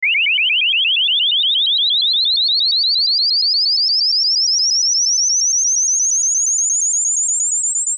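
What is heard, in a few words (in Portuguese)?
Estímulo 2000Hz-8000Hz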